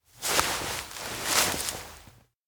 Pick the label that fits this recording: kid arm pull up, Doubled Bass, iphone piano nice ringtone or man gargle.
kid arm pull up